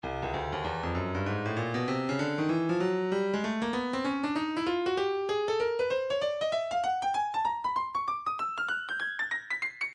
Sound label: breakbeat 097 bpm; ultrafunk a; Stylus Breakbeat Midi Files